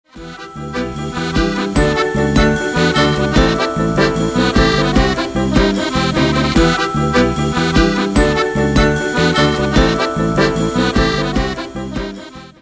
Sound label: Accordion Piano